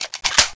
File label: ump45 clip in